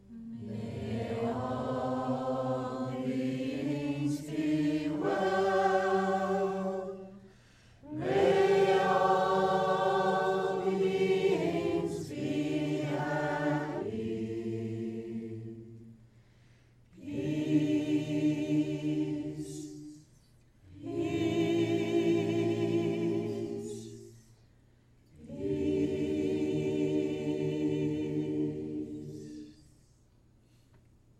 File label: Buddhist Blessing